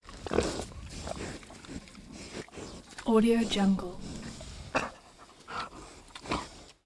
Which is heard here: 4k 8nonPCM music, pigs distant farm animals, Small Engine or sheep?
pigs distant farm animals